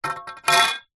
foley metal pole long swimming pool set down concrete; zapsplat